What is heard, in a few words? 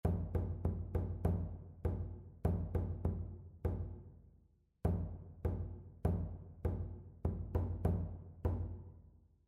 rhythmic error detection